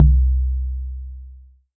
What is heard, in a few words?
Jomox MBrane drum machine; Jomox MBrane 11